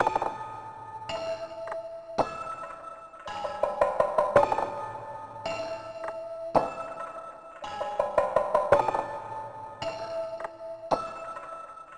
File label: 110 bpm A Harmonic Minor, sinister music loops; Hallows Eve